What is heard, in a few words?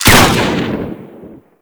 Carrier Sent Shotgun Prime Fire, weapons; DE; Warframe; Sentinel